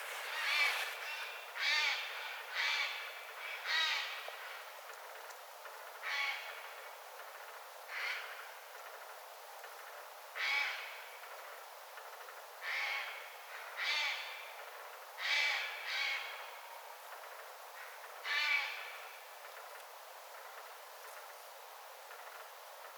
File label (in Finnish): narhet vahan erikoisella aanensavylla huomioaantelevat; närhet ääntelevät erikoisesti, erikoinen sävy niiden äänissä